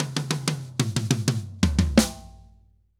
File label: Drums Poly; Best Service; Festa Latina VOL-1; Drums & Percussion